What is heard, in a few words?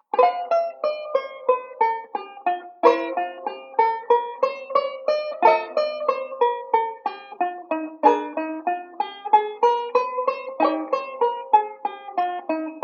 banjo; Beyond Chord Melody Volume II